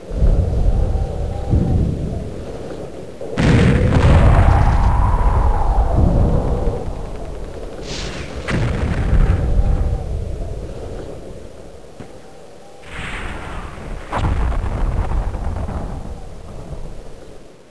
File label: ambience